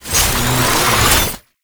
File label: Gunbrella Reload; Weapons; Warframe; Tenno; Koumei